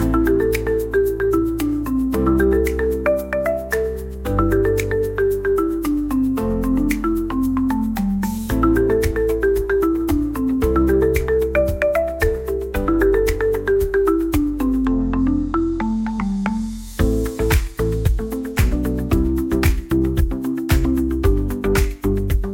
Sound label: ringtone, رنة هاتف مارمبا هادئة; نغمة رنين